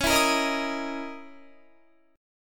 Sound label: Db Minor 6th Add 9th Db down Guitar; strummed